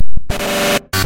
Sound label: warning; a-90